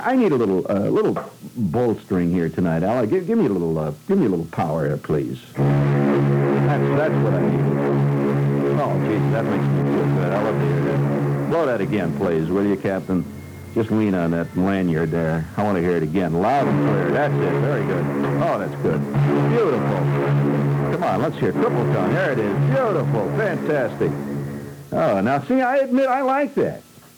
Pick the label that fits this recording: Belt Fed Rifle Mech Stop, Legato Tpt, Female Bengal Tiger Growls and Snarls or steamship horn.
steamship horn